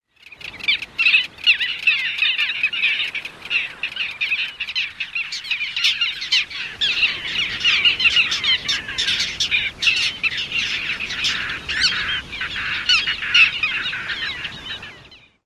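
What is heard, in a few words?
calls of small group, bird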